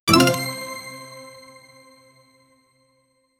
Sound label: anticipation sound or sus